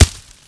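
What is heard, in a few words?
grass; Mafie 1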